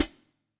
real HiHat, REAL LIVE KITS; A Real Freakin Drum Kit

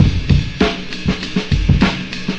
100 classic jungle, drum loops and breaks; 091 to 100 bpm

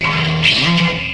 gitar, Guitars

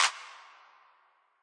Clap

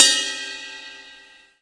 ride bell long

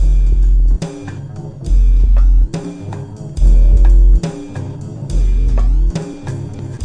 070 trippy bassline on the pitch; SAMPLED MUSIC LOOPS

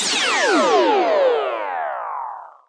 Electro FX; Ueb HE Electro FX

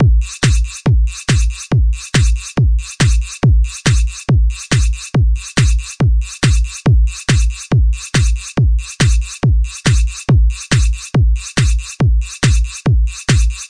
Telephony Beats

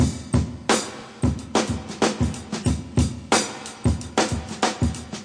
091 break, DRUM LOOPS and BREAKS

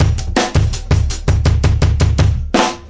live kick, drum loops and breaks, 165 bpm; 161 to 180 bpm

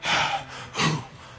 ha ooh beat, vocal or voxy loops

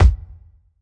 kick

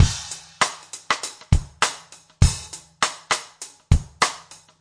basic funk rim sd, 100 bpm; 091 to 100 bpm

